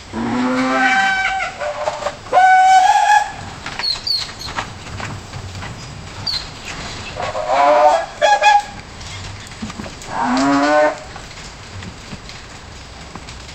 the low moo of, animals

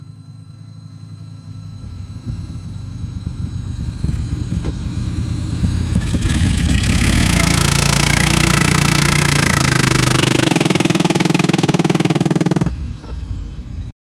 Honda CBR1000RR sound, motorcycles

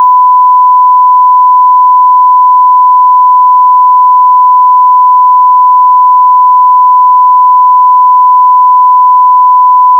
sound for correct, beep

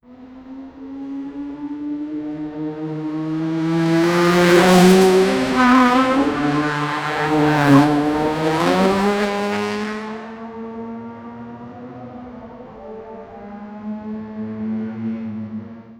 roaring engines and scree, race car

